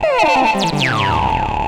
Acid Bass

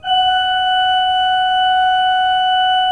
F4, Cathedral Organ; ILIO; Propeller Islands Cathedral Organ

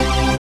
HOUSE, House Dance

